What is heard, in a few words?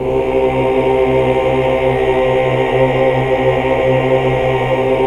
AAH, AAH OCT; Best Service; Hallelujah